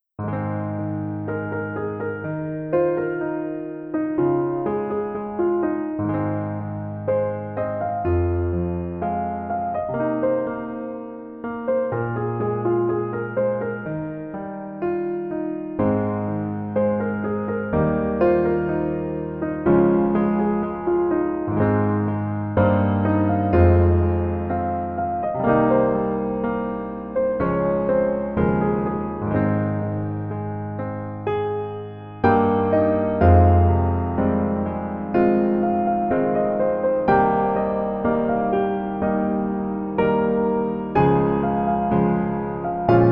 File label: Piano